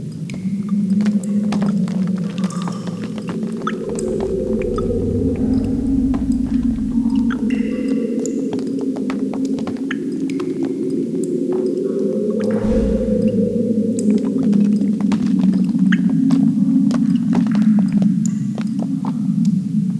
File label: wet cave; firedamp